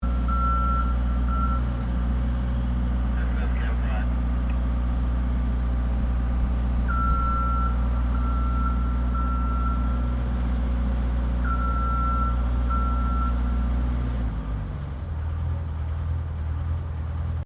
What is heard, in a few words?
Cherry Picker Operating; Various machines; Machinery